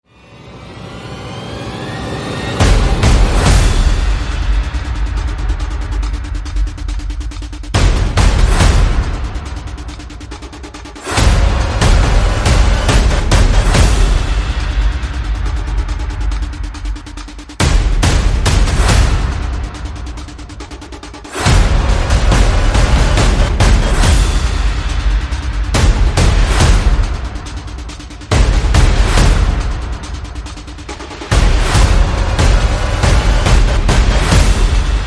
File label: Percussion and Effects